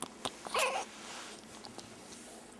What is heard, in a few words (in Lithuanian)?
garsai zaidziant tokius garsus